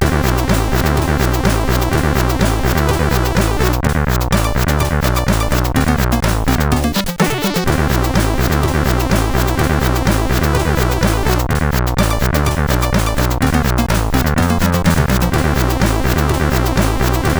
Disko, sid, CSG8580R5

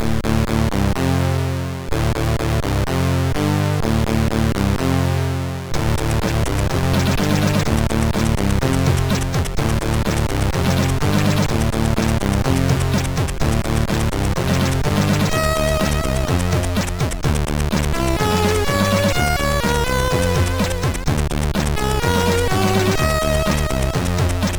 Being Busy, MOS6581R3

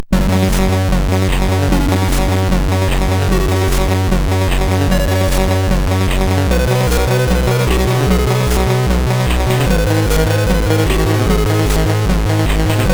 sid MOS6581R4, GAMES; Cloud Kingdoms

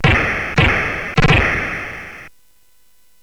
Fast Sfx, CSG8580R5 sid

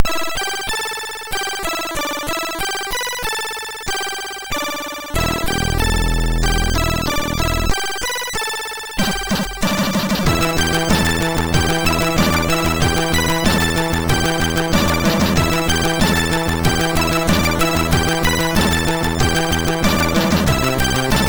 Japanese Funk, MOS6581R2

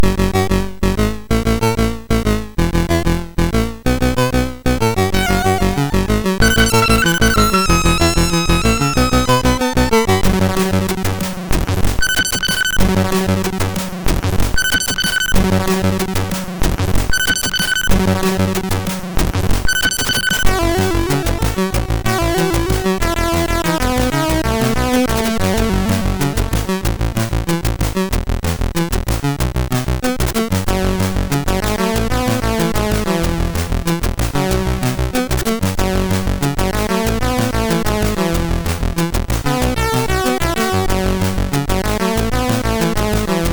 Game Over MOS6581R3; view 34 magazine; Mermaid

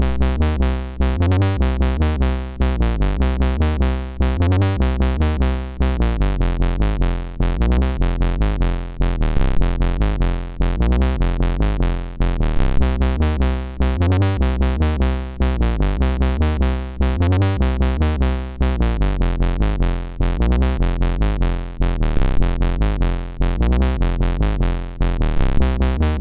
sid MOS6581R2, GAMES; FireTrap

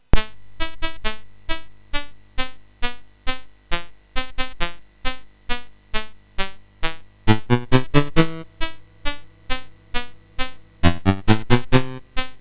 Davok, MOS6581R4

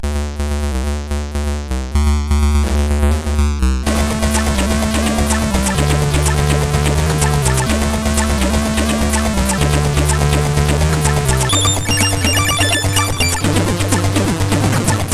tune, sid 8580R5

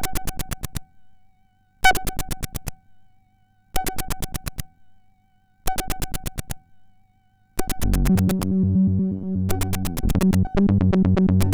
sid MOS6581R4; Optic Gabinet

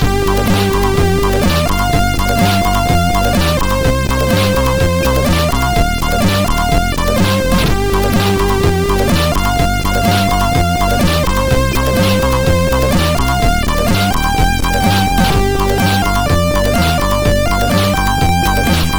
Bomb Fusion, sid MOS6581R4; Japmaster

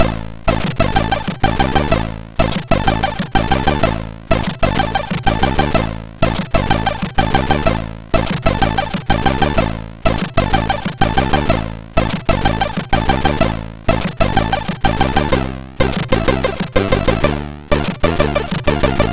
Offbeat, MOS6581R4

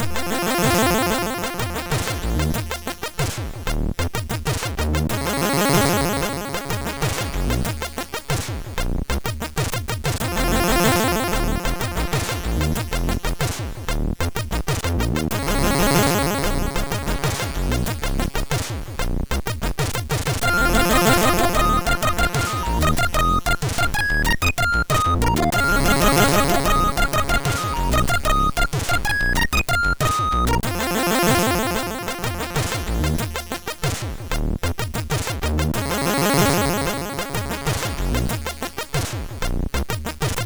Fat Bass, MOS6581R2

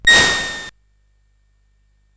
Dark Castle, CSG8580R5

